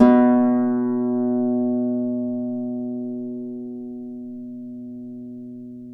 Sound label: Celtic Harp, Plucked Strings